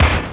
Kick Drum, Drum One Shots; Sonic Foundry (Sony Creative Software); Ambient Grooves; Kicks

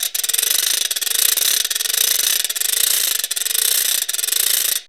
RATCHET, PERCUSSION; AMG - Vince Clarke Lucky Bastard

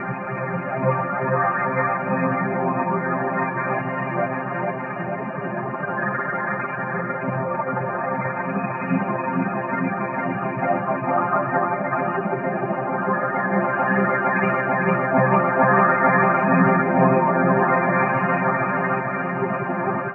grains C Min, Textures

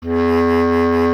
B. Clarinet

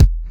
BD, Dance Mega Drums, BD HOU; Best Service

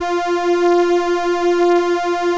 ELKA, String Machines; ELKA RHAP

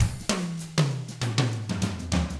FUNKY, Drums

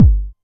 909 Kick; Drums; Novation Drumstation

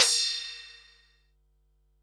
HH, Pearl SC-40 Hihats and cymbals

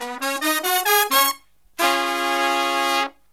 Killer Brass Riffs, HI; USB Soundscan vol.29 - Killer Brass Riffs; AKAI

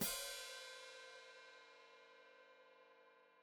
Hat Closed Open, Hi-Hat; Deeply Sampled Drums; Cymbals Stereo

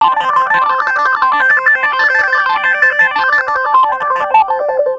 Clavinet; Clavs; Ambient Grooves

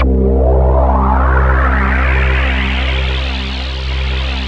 NOIZ SWEEP, AX-60; Rubber Chicken Software Sample Bank

